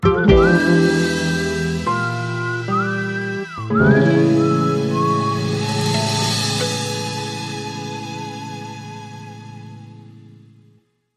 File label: End Sting